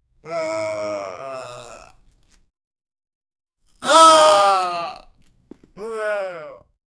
man falling to the ground; impacts

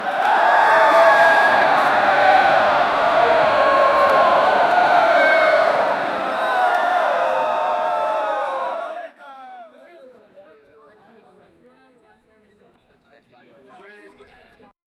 the crowd of spectators, cheering

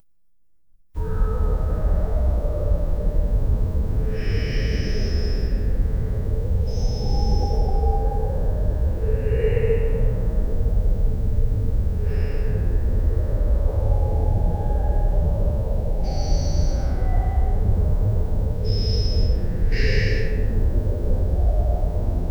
subtle distant house sounds

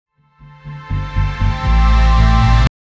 smooth sound for intro to, fashion

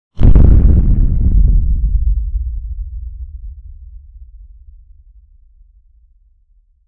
boom impact on earth

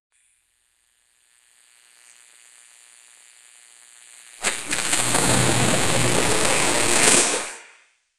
flashback sound; narrative

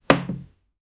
an impact of a fresh, casual game

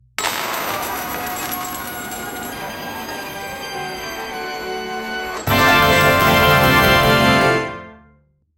ultra fast mega win, circus

